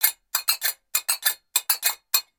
Egyptian Dance

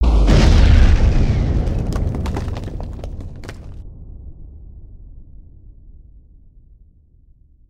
Earth Rail End

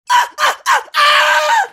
minifig shocking death

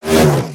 Car pass by

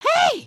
come here, emotes

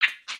Pets Skunk Idle Short; creatures pets; Lego

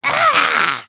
Air Fall; Lego; Strombie; creatures organic

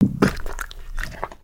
Skullken Idle; creatures organic

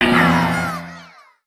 friendly fire; aronwk collection; ndaudio

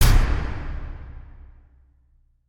piston arrive; spaceship

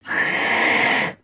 baby spider attack idle; creatures mechanical

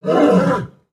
Pets Lion Beg; creatures; Lego

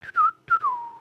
bored whistle; emotes

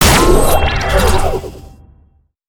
bullhorn

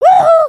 wave, emotes

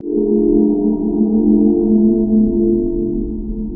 3D Stair Light, ambience; nexus tower

